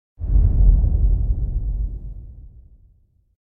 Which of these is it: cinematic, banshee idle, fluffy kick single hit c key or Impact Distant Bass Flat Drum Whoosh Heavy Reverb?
Impact Distant Bass Flat Drum Whoosh Heavy Reverb